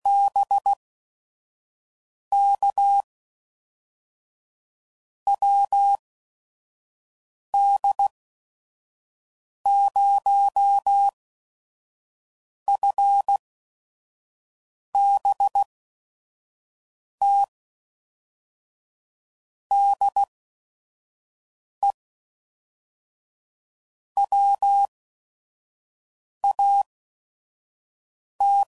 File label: QSOs, CW Code Groups 5WPM; HamRadio; Morse Code